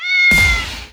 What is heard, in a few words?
Cat; Ui Cat1